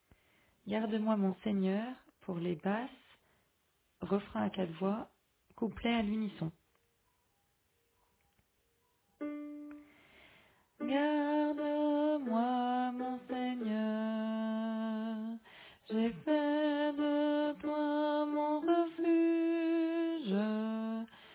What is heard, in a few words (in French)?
Basse